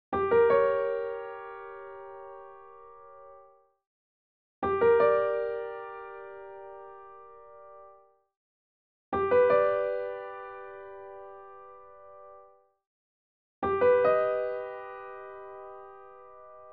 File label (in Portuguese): triades